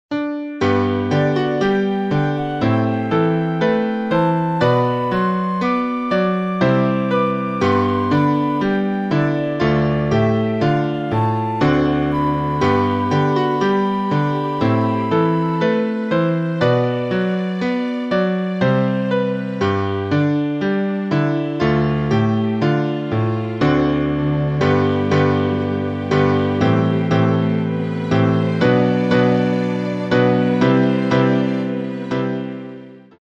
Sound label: carol backing; enniscorthy carol; backing tracks